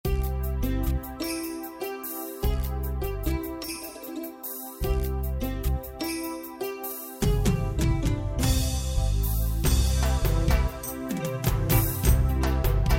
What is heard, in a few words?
DHAMAL C maj, India